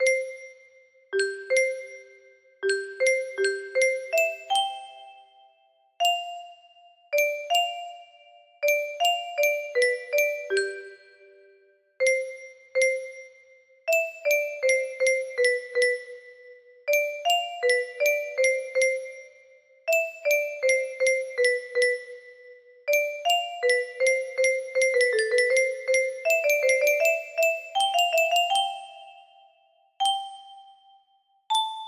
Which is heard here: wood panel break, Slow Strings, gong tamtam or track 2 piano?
track 2 piano